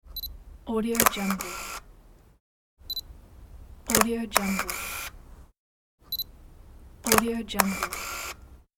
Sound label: photo camera pack; sonicmood